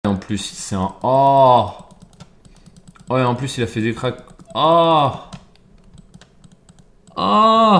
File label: aww